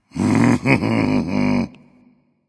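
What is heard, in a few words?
thinking, nonverbal; smite; voicepack; khepri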